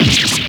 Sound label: stab